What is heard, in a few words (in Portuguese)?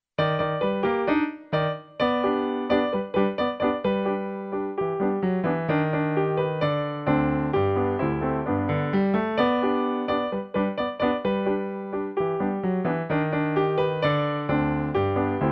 PIANO; Marcha soldadinho